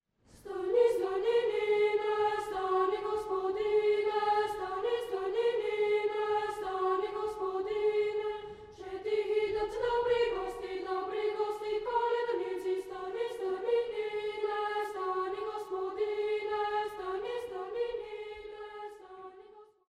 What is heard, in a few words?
Bulgarian Folk Christmas Songs, Boys' Choir; Български коледарски песни; Sofia Boys' Choir